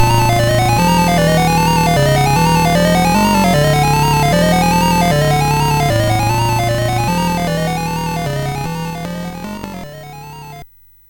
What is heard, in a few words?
Musicbox, sid MOS6581R4